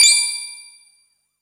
glip, Bells; Misc Percussion

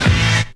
Stabs

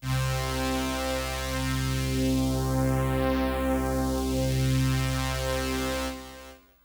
rizer, synths; Notes

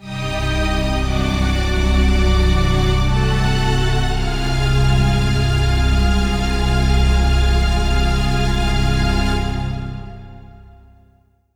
keys, Loops, Sad

